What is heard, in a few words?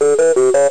spell